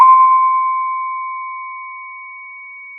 pop, Sound of a church bell

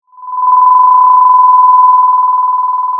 Sound of a phone ringing

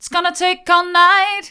its gonna take all night mid, vocal

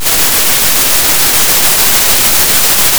Sound of a thunderstorm

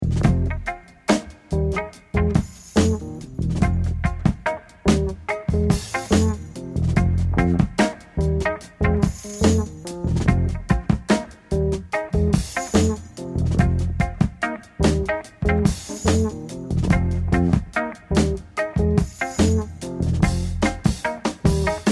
watermelon groove

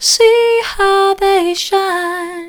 see how they shine soft, vocal

